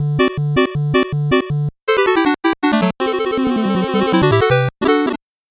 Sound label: 8bit jingle fast